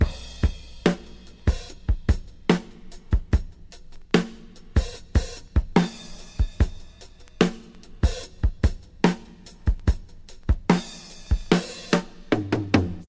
73 bpm hq breakbeat sample d key, Free drum beat - kick tuned to the D note